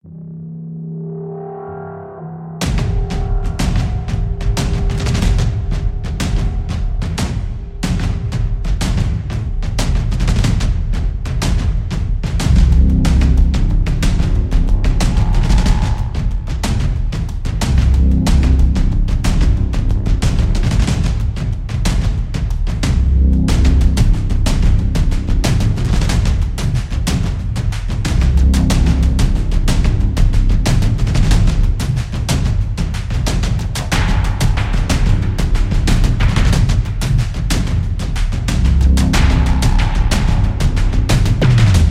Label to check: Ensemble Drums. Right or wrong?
Right